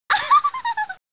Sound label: Laugh